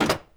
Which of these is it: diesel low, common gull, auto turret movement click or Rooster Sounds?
auto turret movement click